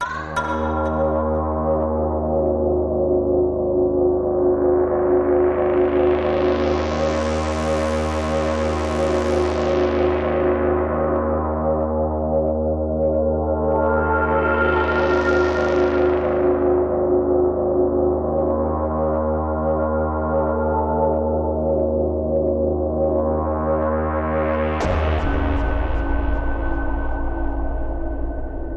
Unearthly Transformation Music Pad

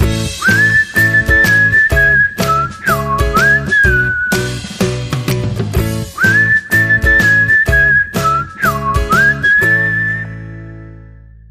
Whistling

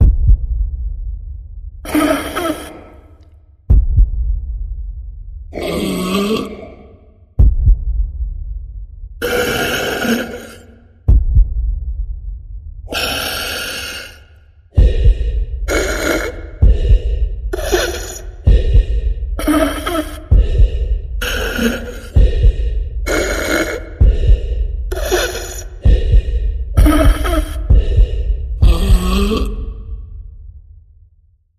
Out of Breath Rhythm

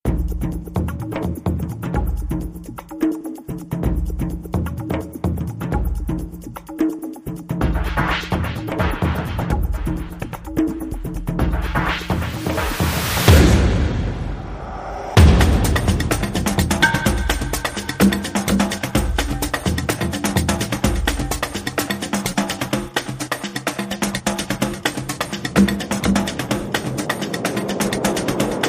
Surrounding Drums

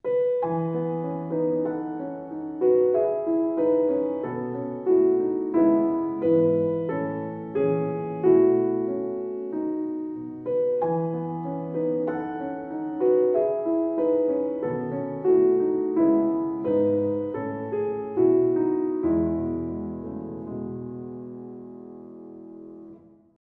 Solo Piano

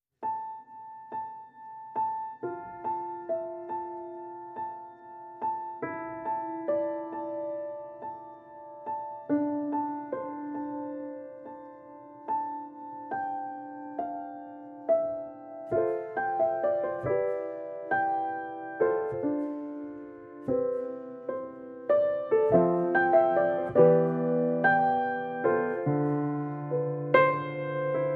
Piano

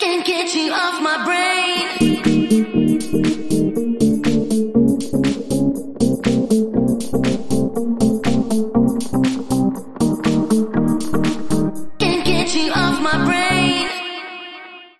In And Out Guitars And Claps

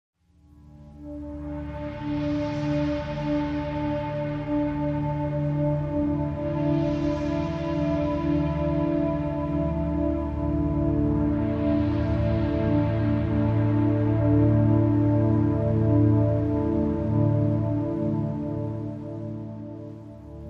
High Pads Only

